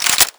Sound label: bolt back, mp5; garrysmod; brightmp5